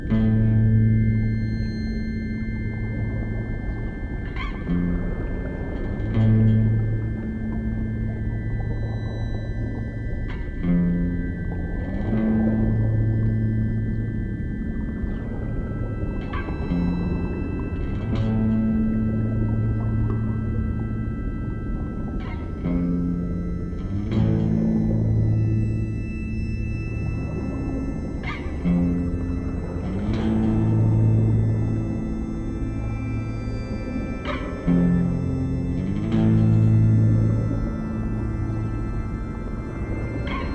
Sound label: Instrumental Version